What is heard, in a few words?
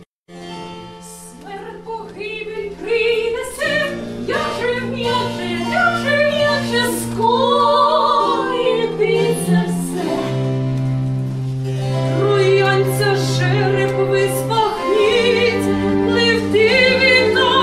air & choir; Dido&Aeneas